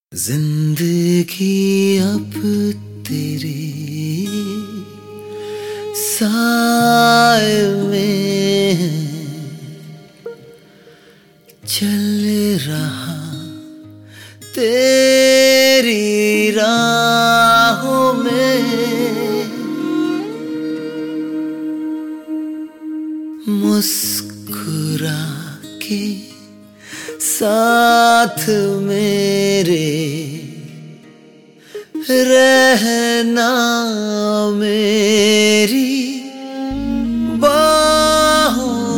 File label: sad version